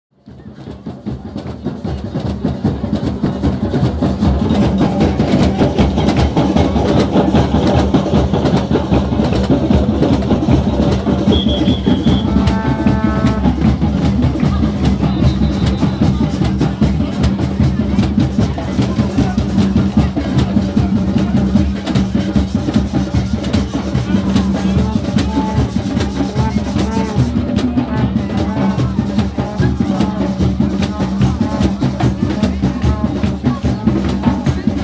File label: Tamtams Mont Royal